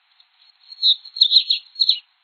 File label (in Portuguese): baiano